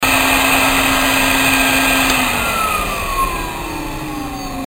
Aspirateur

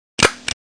Agraffeuse

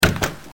Porte

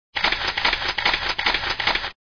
Appareil photo

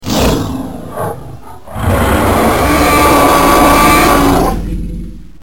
Dinosaure

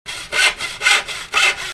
Scie

